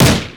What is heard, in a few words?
machine pistol; weapons; ufoai